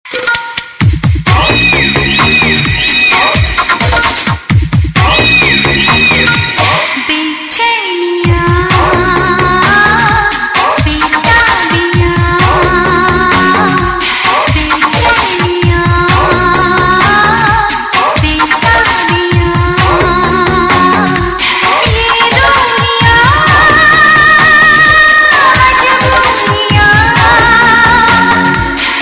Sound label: Ringtone